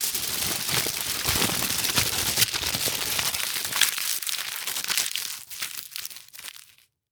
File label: Foley Tin Foil Crinkling Into A Ball Fast